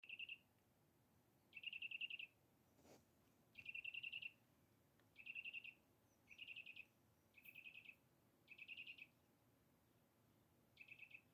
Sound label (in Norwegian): fugl